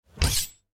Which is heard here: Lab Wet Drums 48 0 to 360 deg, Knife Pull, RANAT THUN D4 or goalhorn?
Knife Pull